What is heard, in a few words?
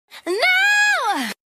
nooooo